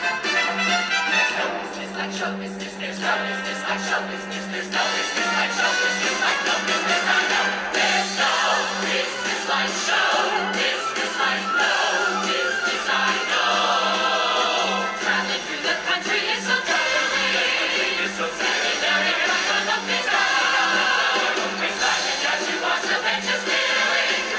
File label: opening music